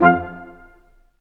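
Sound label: BRS Q.SHT, Roland, brass staccatos, quintet; Roland L-CD702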